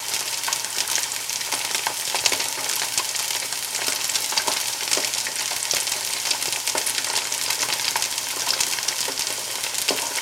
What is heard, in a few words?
Frying Sizzle Low